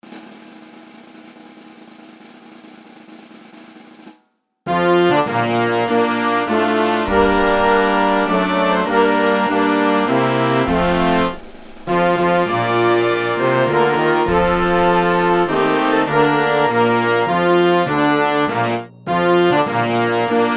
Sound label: star spangled banner, concert band